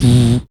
Beatbox; 90 sSampleCDs; Coldcut's Kleptomania VOL1

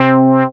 SYN SH, Roland; Sound & Vision - Gigapack I CD 2

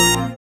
SYN PLEAS, dance synth; Sound & Vision - Gigapack I CD 2 (Roland)

